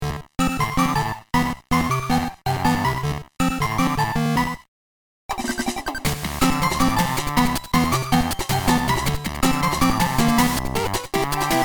Love Quest Accepted; Shroom City

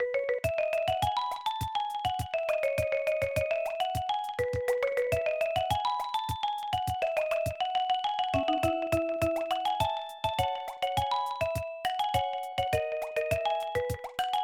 Level Clear

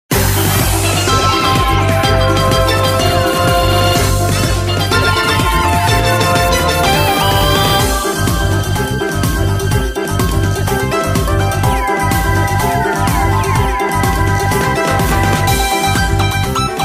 Dream Long Jump

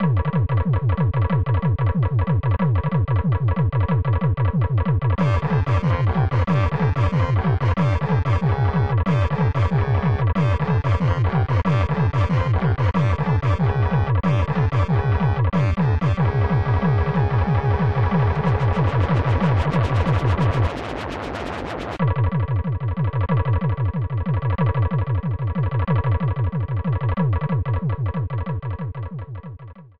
Flyswatter Level 3; Mario Paint Prototype

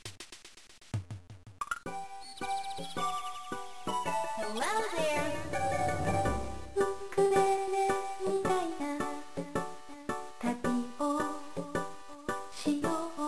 Karaoke Theme

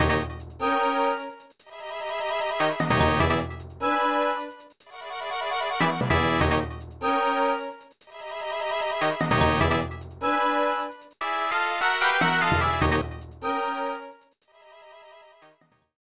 Music Pre-Boss (No Voice)

